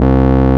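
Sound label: RMI piano C 1, EP; Sonic Reality - Vintage Timetraveler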